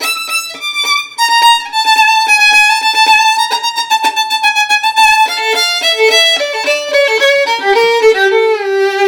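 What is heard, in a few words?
VIOLIN LOOP, Asian Traditions; USB Soundscan vol.60; AKAI; VIOLIN LP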